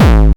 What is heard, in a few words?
Bassdrums Hard Kicks; Vengeance Samples